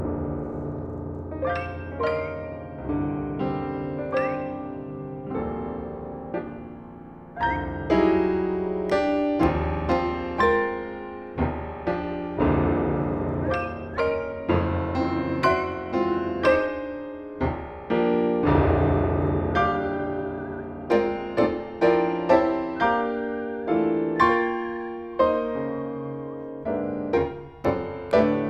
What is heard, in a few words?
Ballad